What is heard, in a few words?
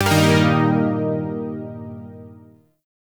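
Shawzin Pentatonic Minor Chord; Zariman Shawzin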